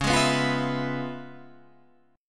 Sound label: C sharp 7th Sharp 9th Guitar Half Step Down, chord; C#7#9